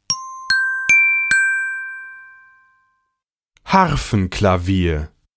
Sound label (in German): Harfenklavier